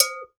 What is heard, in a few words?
GANKOKWE, Drums&Percussion, Gankokwe Bells; E-MU Producer Series Vol. 7 – Old World Instruments